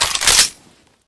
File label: colt clip in, weapons; kuilu